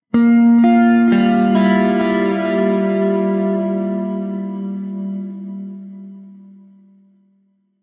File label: Clean